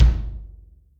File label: 2HeadLo, Yamaha DTXpress Acoustic Kick; drum machine